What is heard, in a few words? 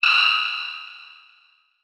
Transition Hit Impact Short